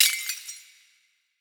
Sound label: Glass Break; Cymatics; PHARAOH Beta Pack